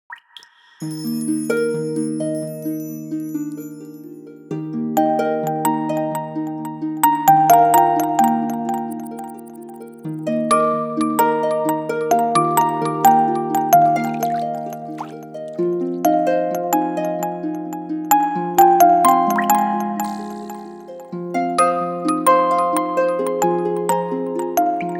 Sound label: Ripples, ringtones